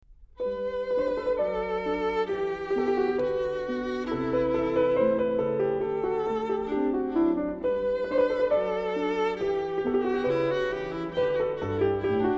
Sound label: Violin Sonata in G, Tema; Mozart